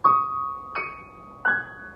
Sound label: piano; cstrike